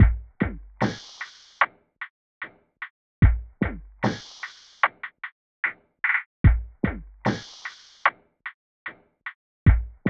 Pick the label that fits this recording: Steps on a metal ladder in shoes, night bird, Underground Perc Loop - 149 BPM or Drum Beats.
Underground Perc Loop - 149 BPM